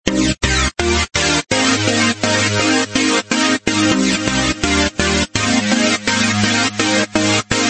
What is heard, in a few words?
MIDI; BQ Russia BQM 1401 Monza